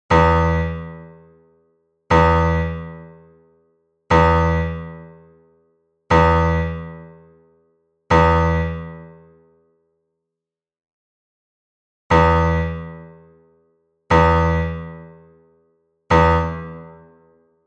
basse; Ituringo arotza; F.Remacha

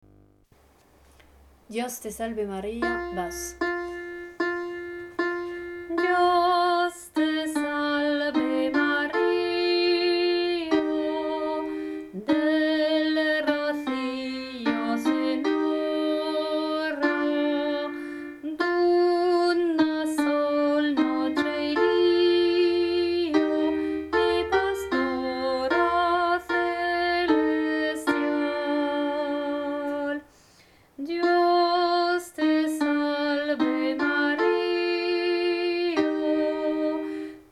Training Basse Chanté; Dios te salve Maria

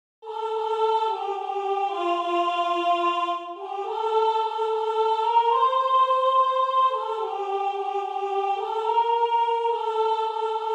voix mixtes so Tempo72; Maitia nun zira; Urunuela; soprani

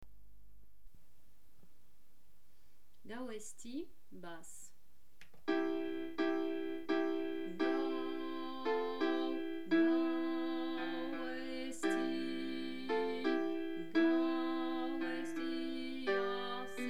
4 vx Training Basse Chanté; Gau Ezti

